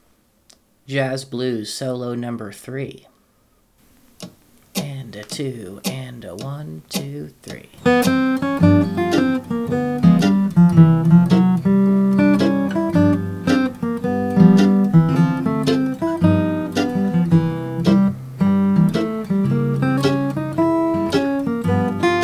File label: Jazz Blues Etude